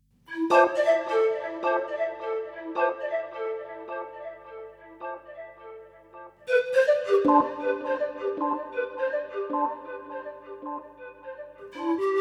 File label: swamp spooky head 80bpm